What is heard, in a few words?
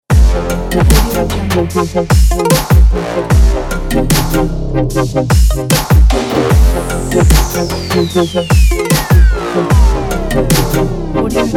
trap logo pack